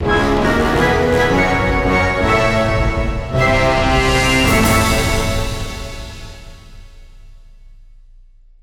Celebrate Stinger; Disney Infinity

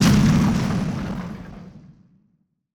Skill Burst; Yoda

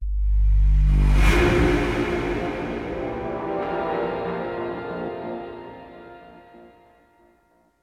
Enemy Helo Destroyed; modern combat 5 blackout; android

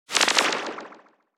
sandfish death

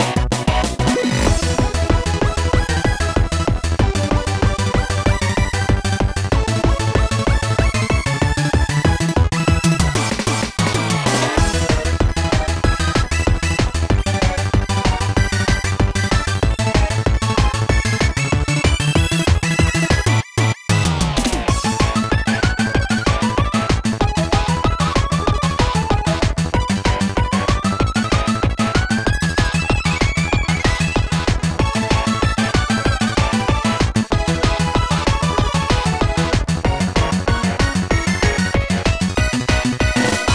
Battle Theme Techno Machines; bomberman 2; nintendo ds

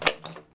Wet Footstep; Backrooms 2D

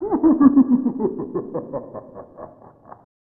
Evil Laugh; Time Cop; snes

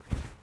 Cloth Walk

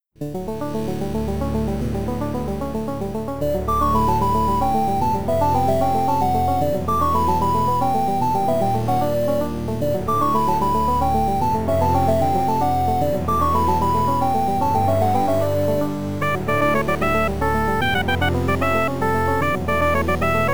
8bit Clock Town

